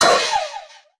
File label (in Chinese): 受伤声; 悦灵